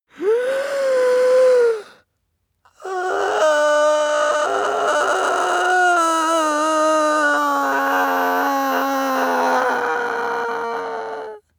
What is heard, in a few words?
male inhale exhale whine hard; soundpool